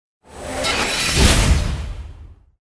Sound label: Ratman Atk; Seer; GamigoNA